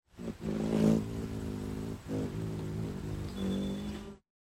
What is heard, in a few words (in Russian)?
zvuk krylev kolibri